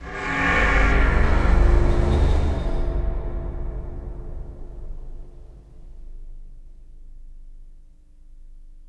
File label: IMPACT SCARY, Cinematic Impact